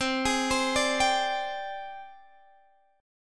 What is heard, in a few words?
G sharp Major 7th Flat 5th, chord